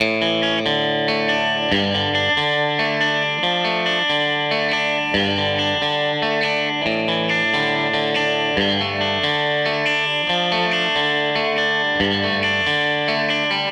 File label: Strat Guitar Loop; Sonic Foundry (Sony Creative Software); Six-String Orchestra